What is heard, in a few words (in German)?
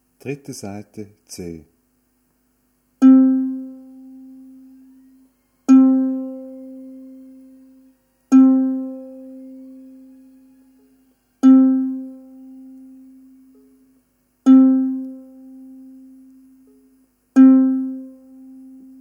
3. Saite C, ukulele